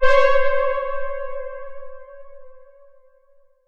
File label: Dark Chord; AMG - Prototype Drum & Bass